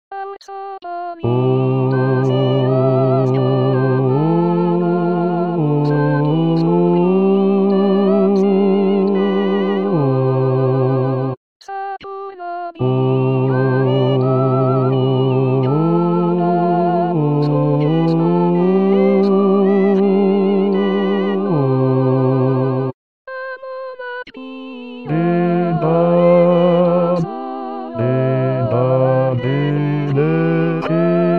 aurtxo seaskan bajos

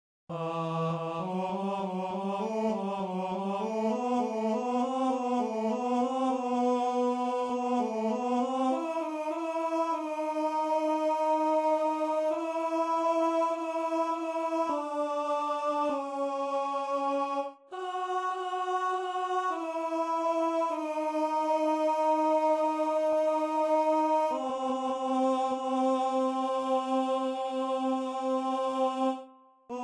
crucifigatur tenor

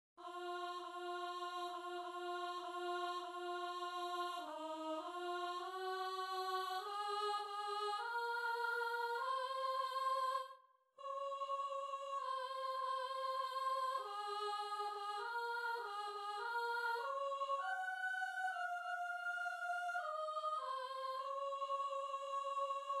in monte oliveti soprano

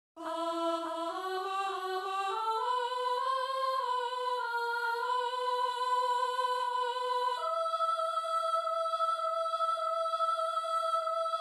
crucifigatur soprano

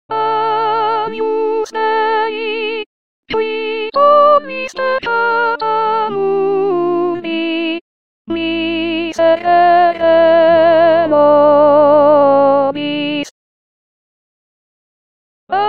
agnus dei de la misa brevis sopranos

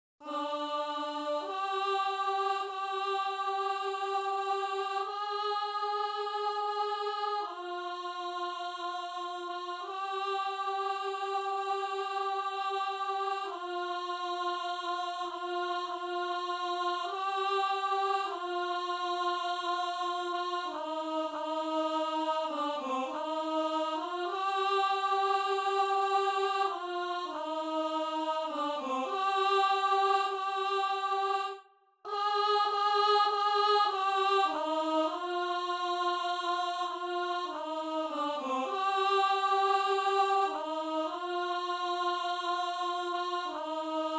o jesu christe contralto

